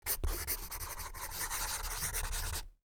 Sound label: Writing signature with blunt pencil